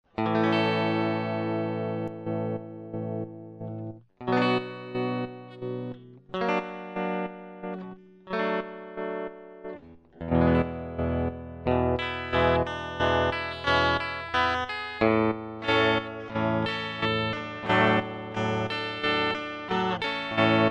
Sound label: stompbox tremulus